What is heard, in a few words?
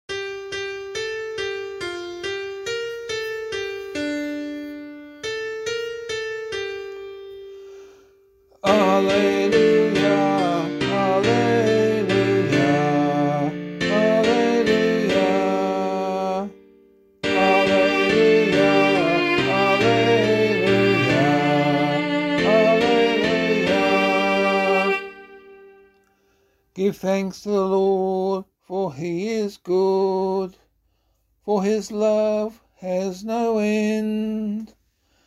Psalm vocal